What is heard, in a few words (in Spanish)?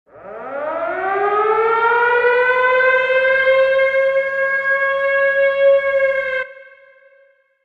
Alarma